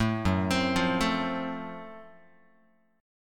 Gb Minor Major 7th Flat 5th Gb Guitar; arpeggiated; 0,2,3,3,1,x; 4A,4E,5A,5D,5G#,6C#

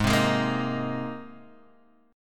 Bb 7th Suspended 2nd Suspended 4th Ab

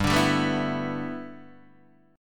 G 6th Add 9th G Guitar DADGAD, chord

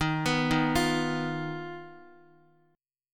Eb Suspended 2nd Sharp 5th Eb Guitar; Ebsus2#5 arpeggiated; JGuitar

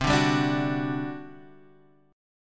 G Major 7th Suspended 4th, C

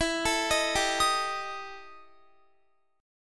E Minor Major 7th Double Flat 5th E Guitar; EmM7bb5; arpeggiated; x,19,19,20,19,22

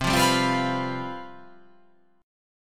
C Major 7th Suspended 4th, down

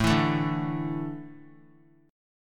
A Suspended 4th; Asus4 strummed; JGuitar

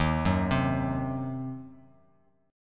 D sharp Minor 6th D sharp down; arpeggiated; JGuitar

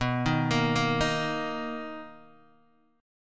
B Major 7th B down Guitar Open D

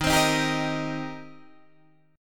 D sharp 6th, D sharp, down, Guitar, Open D

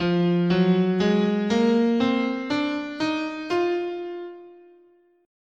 F Phrygian sharp6, scale

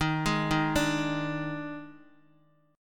Eb Major 7th Suspended 4th Eb, Bass Standard; arpeggiated; EbM7sus4

